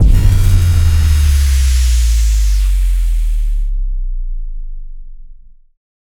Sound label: bass drop